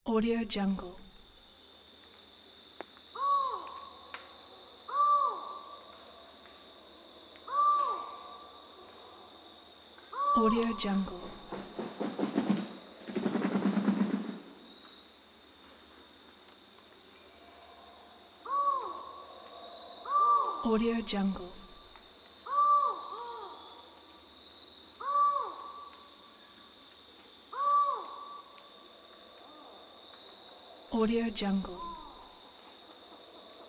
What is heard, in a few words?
jungle sounds